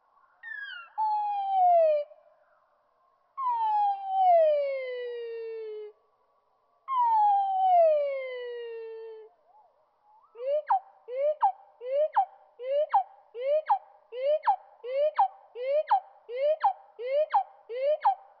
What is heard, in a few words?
Northern Cardinal